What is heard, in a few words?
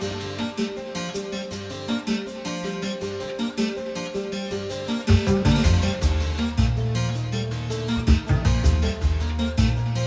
forest bgm spooky loop; chicory a colorful tale; ps4